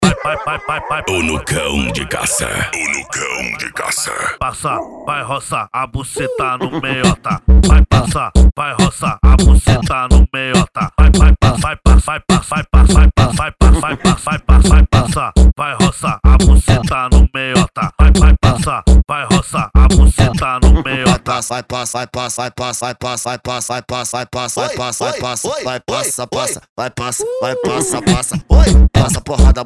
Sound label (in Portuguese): funk ritmado